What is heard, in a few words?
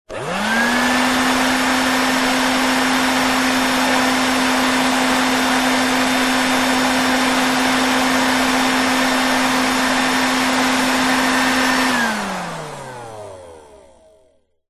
vacuum hand held small burst off; household; zapsplat